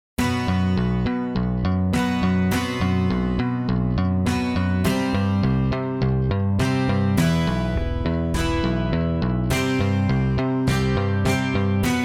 acoustic cover

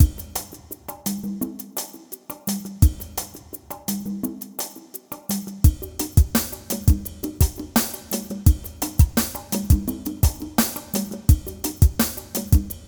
Jingle Bells

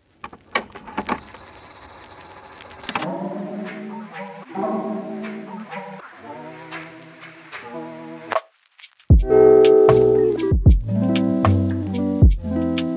Lofi jazz

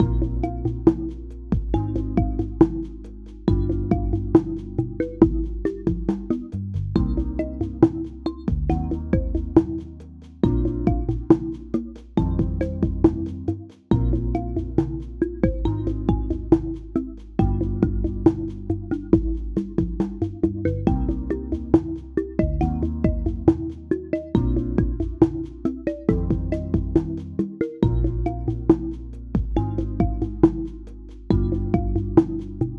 Lofi

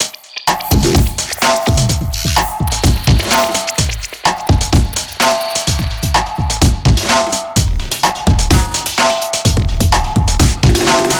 Dubstep

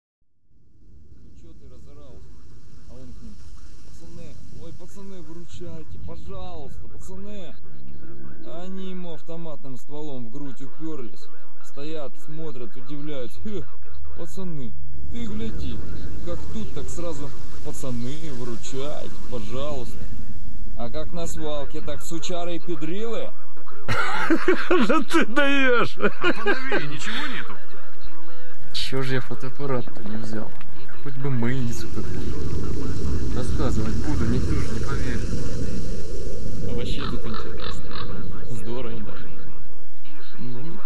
hybrid cover mix guitar

